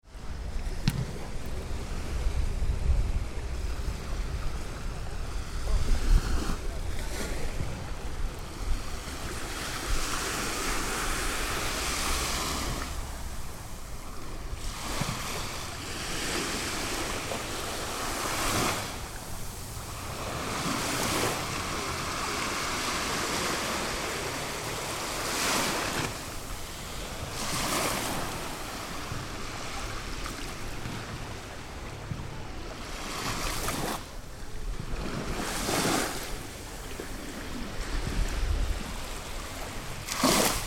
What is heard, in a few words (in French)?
la mer plage des catalans